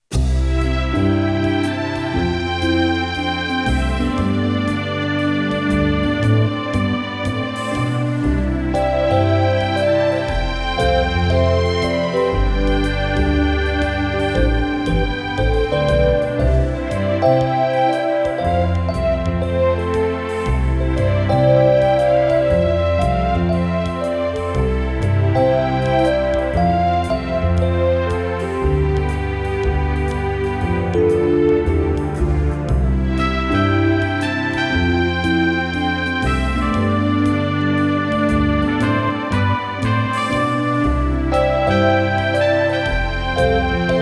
BGM; Noctropolis; MS-DOS

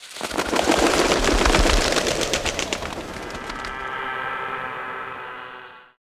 seaguls flying; chibi robo photo finder

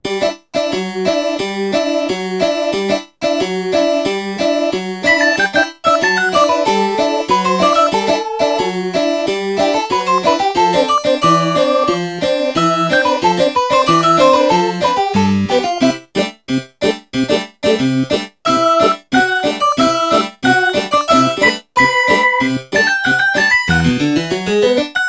skipper (synth); Napple Tale: Arsia in Daydream

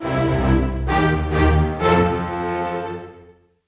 Winfare; Cranky; Where's My Water 2

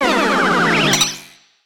Surprise Attack Fanfare; paper mario 2 the thousand year door

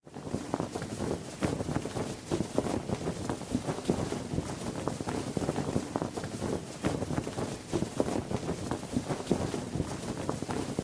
Falling Wind Sound; Granny 3; Android

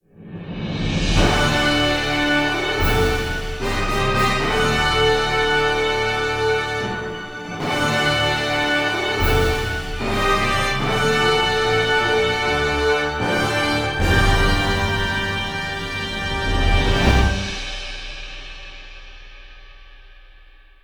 Orchestral; Age of Conan Hyborian Adventures; Stygian Fatality

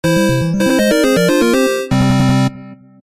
Boss Clear Fanfare (8-Bit); Shadow Links

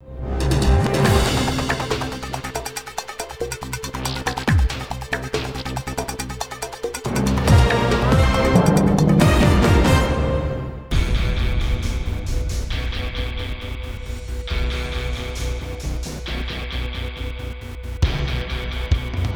Music Loading Eastside; battlefield hardline; ps3 ps4 windows xbox 360 xbox one

